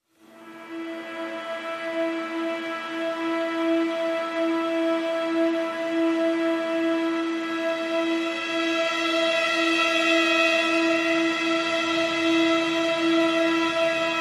PADS Uneasy String (E)

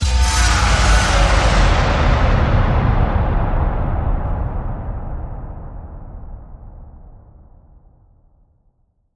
Warriors Blast Power Down

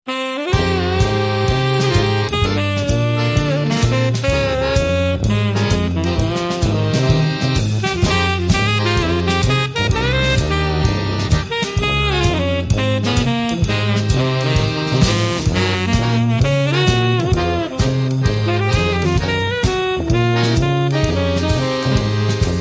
Jazz Variation

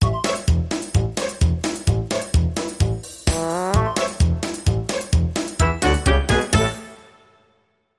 Sting

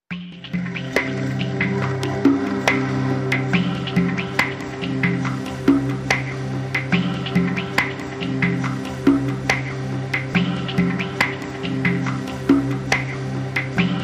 Chill In The Rain Forrest

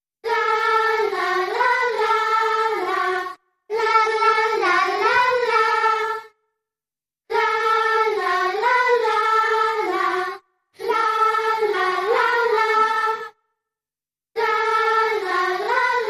Slower Choir Only

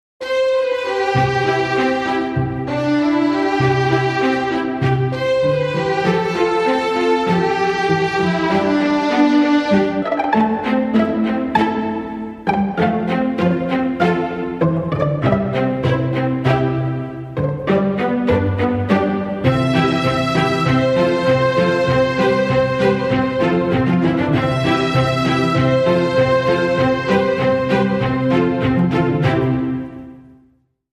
Our First Date No Woodwinds

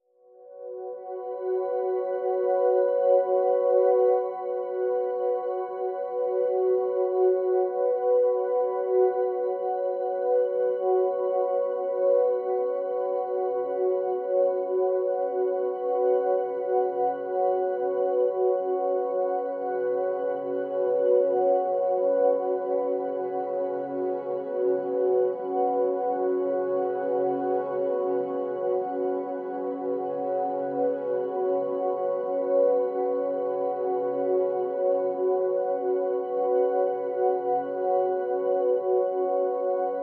Pads Lifted (B)

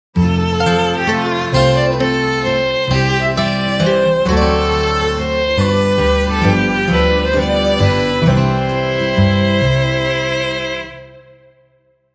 Waltz, Sting

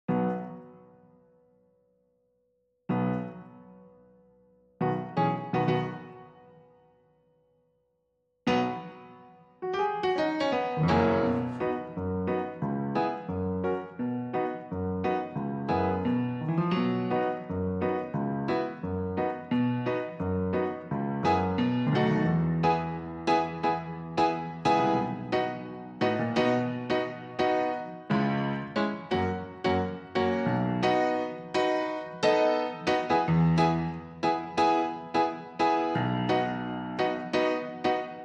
Piano Clean

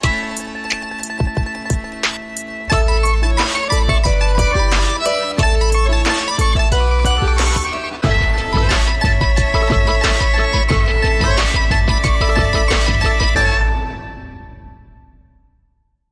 Mandolin Groove

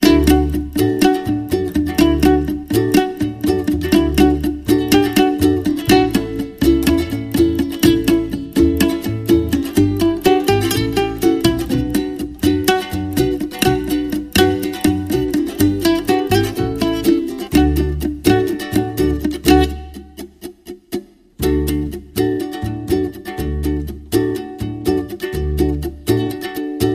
Jangley Little Ukulele

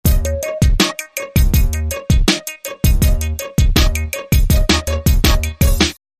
Robotic

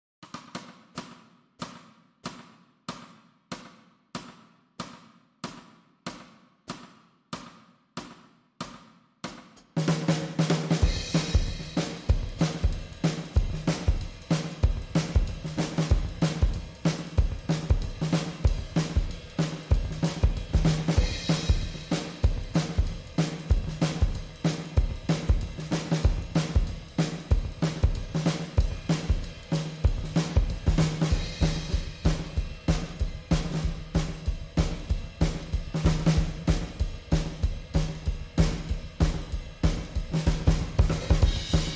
Drums Clean

